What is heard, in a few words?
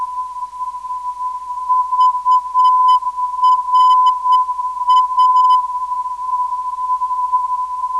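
VRA 294kHz, non-directional beacon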